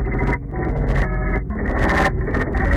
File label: loop; svencoop; rustworld